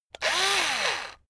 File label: electric drill screw out short; soundpool